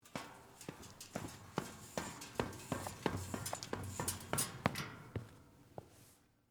Metal Stairs Down, Footsteps, Metal, Staircase, Walk, Down, Fast; Footstep Sound Effects; Blastwave